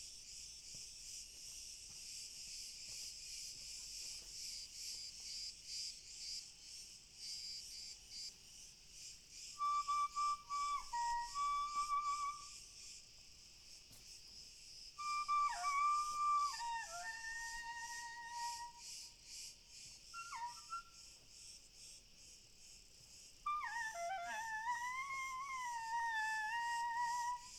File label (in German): Grillen Frei Spiel